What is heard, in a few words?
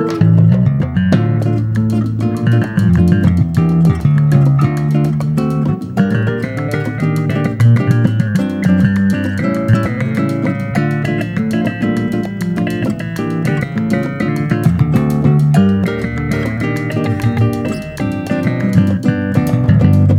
Dune Dance bass solo